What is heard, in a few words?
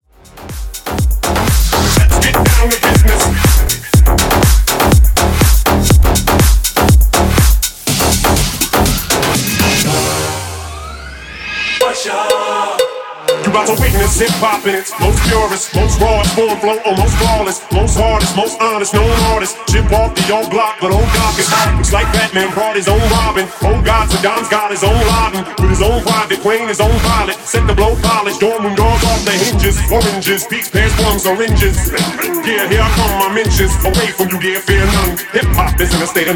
122bpm